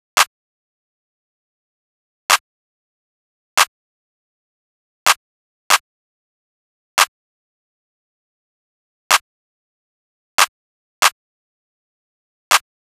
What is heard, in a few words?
Drum Loop - 141 BPM Snare, Drill; Loop Stems; PARADOX II - Drum Loop Collection; Cymatics; Harpoon Drum Loop